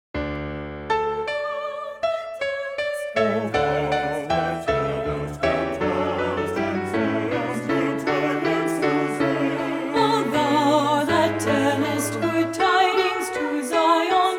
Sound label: o thou that tellest good tidings alto; Messiah; Handel